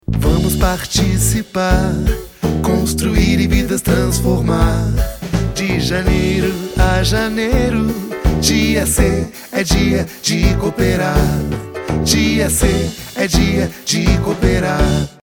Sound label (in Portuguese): Jingle